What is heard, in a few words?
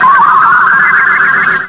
DINGLE